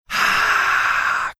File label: mimicking sound of roaring crowd